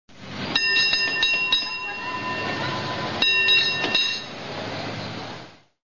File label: trolley